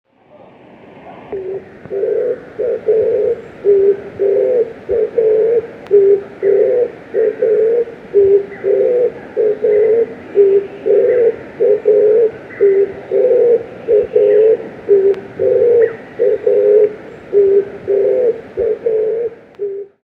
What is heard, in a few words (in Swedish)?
Storre turturduva